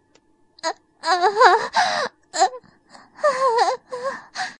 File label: moaning